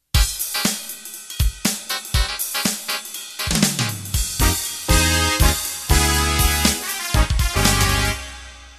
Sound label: Jazz Funk Intro